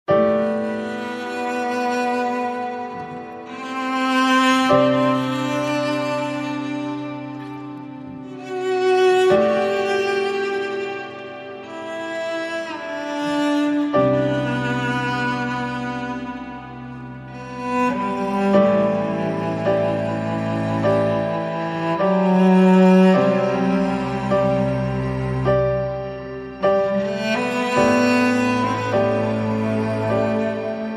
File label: No Lead Pianos